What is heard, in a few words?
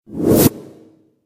eyeball teleport; halloween eyeball